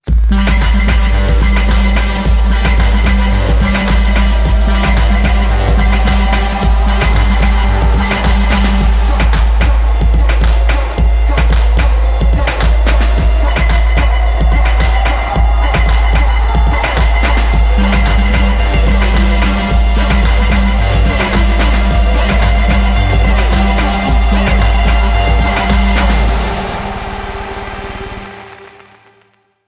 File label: Dub